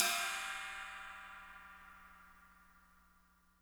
china cymbal

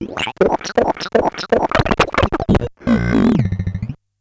Bent Talking Teacher, Circuit Bent

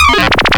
noise 2, sound noise; NOISE+ALARM

